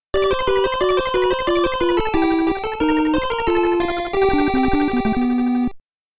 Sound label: Game Over BGM; Magical Action 5